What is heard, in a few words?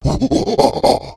Knuckles Play